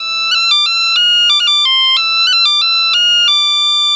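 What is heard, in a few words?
bagpipe 90, Celtic Flavours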